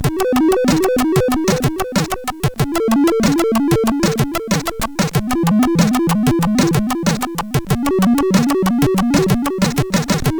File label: No Bass, sid CSG8580R5